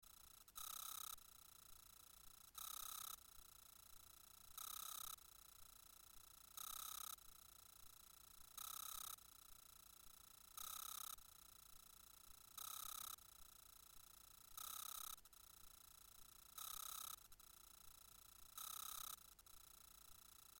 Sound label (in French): cigales saccadees nettes echo